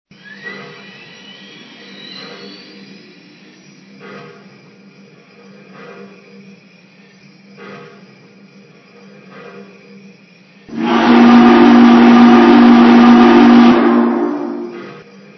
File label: 484 GS4, trains